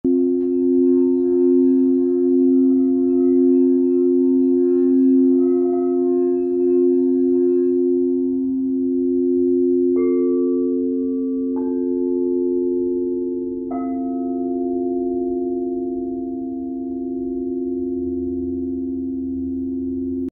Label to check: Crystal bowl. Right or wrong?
Right